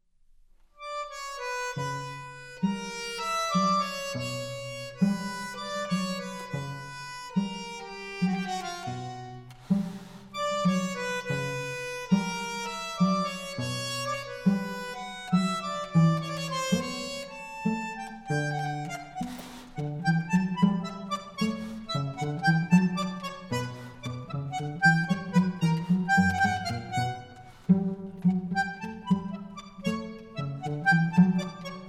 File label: tango antiguo